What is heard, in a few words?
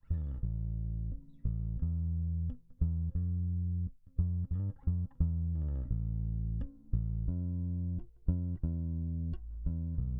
bass parallel